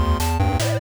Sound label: 8bit test